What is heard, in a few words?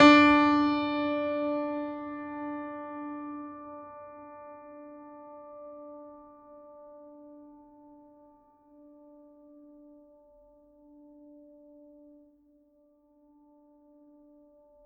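Classical Piano D3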